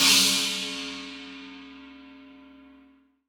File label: ride cymbal sound sample c sharp key